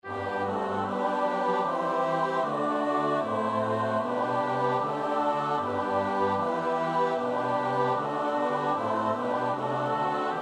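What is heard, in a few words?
soprano alto tenor bass; Bach (Johann Sebastiaan); BWV 112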